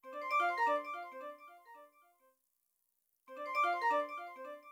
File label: nokia 2600 vent ringtone